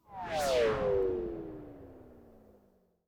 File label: FX Industrial 140